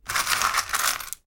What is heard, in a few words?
coins